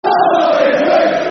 Come On Ipswich, Football Chants; fanchants; Ipswich Town Itfc

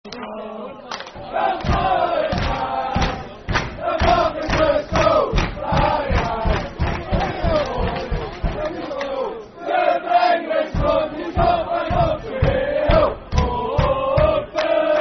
Forever And Ever Football Chant (Soccer Song); Partick Thistle Ptfc

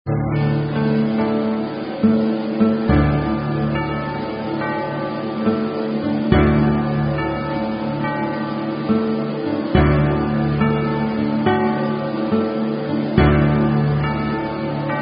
Taps Karaoke; Girl Scouts

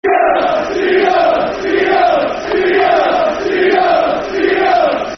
Seagulls Football Chant (Soccer Song); Brighton Bhafc

